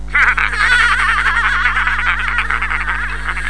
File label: laugh